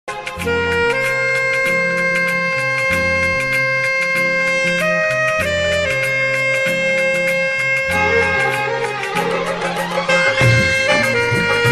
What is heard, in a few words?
saxophone ringtone hindi ringtone